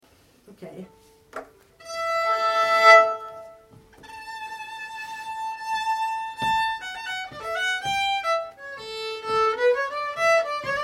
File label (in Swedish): Polska; Polska e Andreas Sifvertson Klaxasen